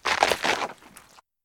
Limb and Flesh rip and chewing; RadwinIsland

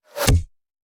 Bullet Impact; Sound Ideas; Guns

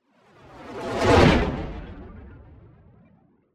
Space Ship By - Fast Pulsating Engine; Hollywood Edge; sound designer's toolkit v2

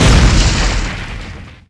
Explo Medium Rock Wall; Destruction

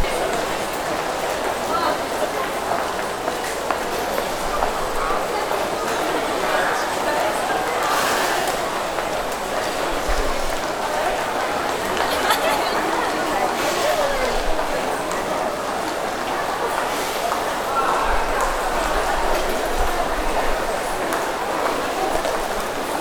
trainstation japan people; best service Blue Box

